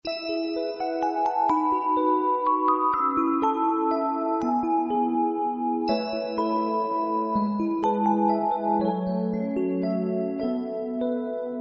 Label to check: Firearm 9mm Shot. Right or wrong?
Wrong